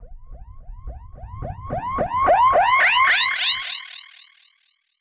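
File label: Filter Processed, alarm siren samples 125bpm; musicradar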